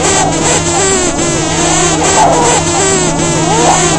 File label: sucking noise loop; WORLD